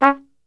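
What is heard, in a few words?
C#2, flugelhorn, brass; Best Service; Brass Super Section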